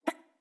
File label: free spins star bounce; netent